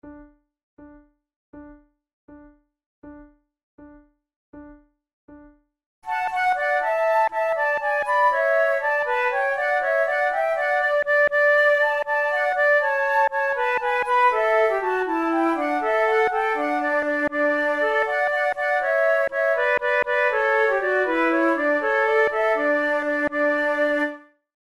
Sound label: trio